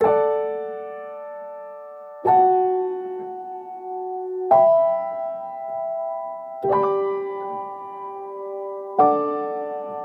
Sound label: Rainy Days Calm Instrumental; Growing Up